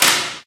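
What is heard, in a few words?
physics clipboard impact small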